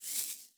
Soft Dry Rustle

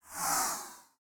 Swoosh Transition

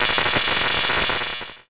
Buzzing Power Glitch

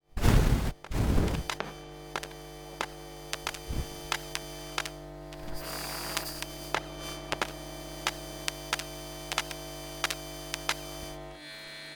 Long Buzzing Soft Popping Power Glitch

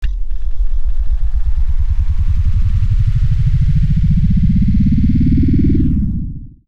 accelerating sci-fi drone

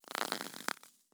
Rubbery Rustle

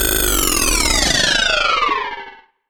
Sci-fi Malfunction Rumble

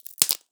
Wood Crackle

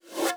Soft Slide Accelerate

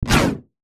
Sci-fi Pulse Gun Blast

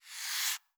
Soft Slide Short

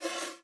Soft Slide Short

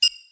Single UI Beep

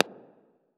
Echoing Pop

